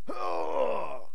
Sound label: Pain, soldier; hellenes